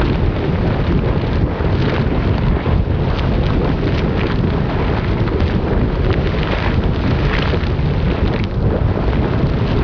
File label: lava, ambient; quake; deathmatch